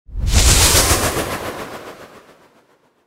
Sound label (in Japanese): 風魔法 モノラル